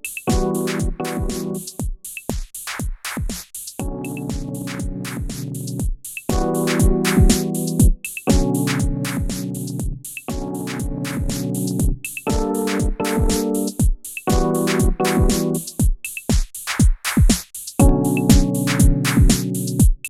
tascam model 2400 compressor test

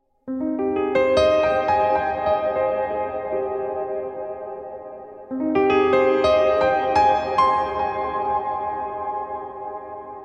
Piano Gothic Hall Large; Noire

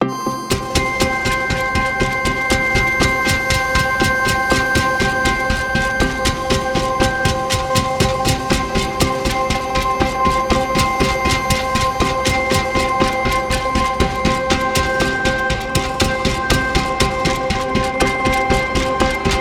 Nordic Spheres Kayaking; SonuScore

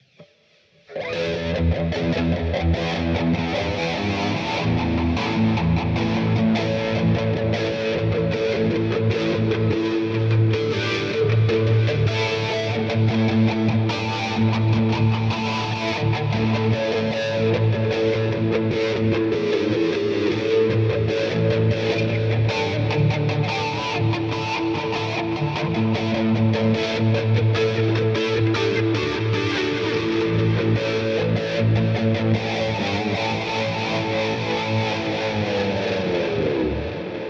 Blackstar St. James Shaky Robot Modded

